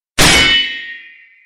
Deflect; Sekiro

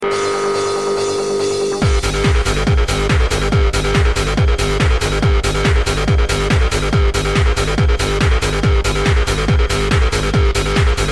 Techno Beat

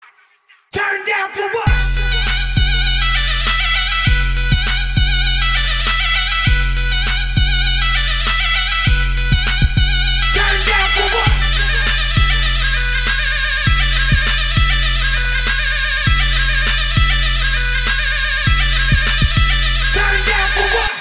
Turkish Remix

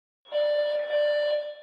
PA System Beep; SCPF

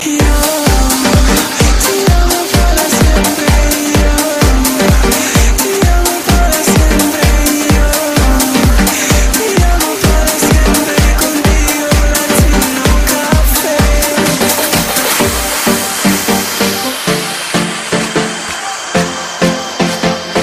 Latino Caffe

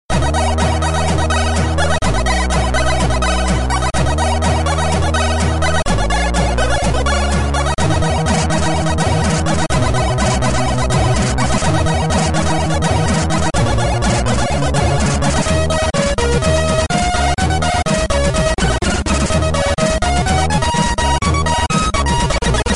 Cyberfunk

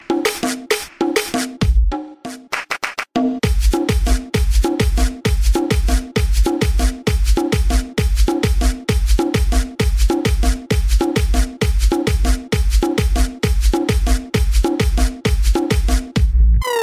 Tribal

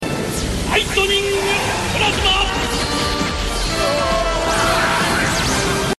Lightning Plasma

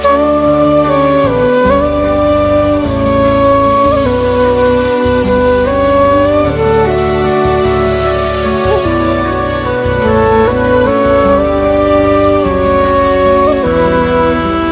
Violin

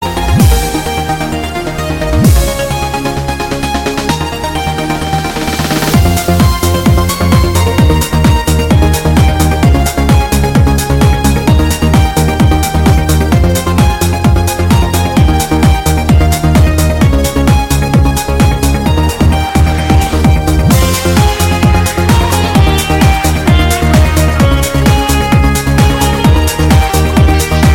Rnb Remix

